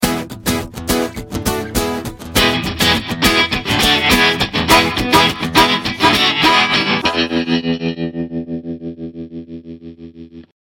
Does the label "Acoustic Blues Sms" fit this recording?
Yes